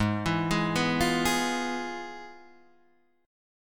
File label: F Minor Major 7th Flat 5th, Ab